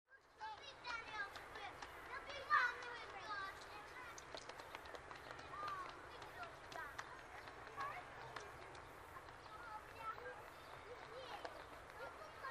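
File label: Playground Background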